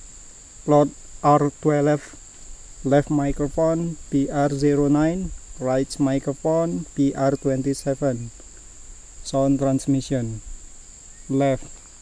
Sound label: birds